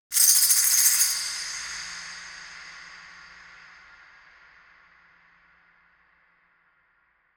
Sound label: Voodoo 142 BPM, Ethno, Psytrance; Ethno Box; Voodoo Gris Gris